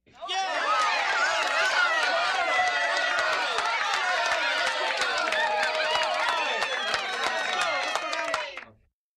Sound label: Very Excited React, party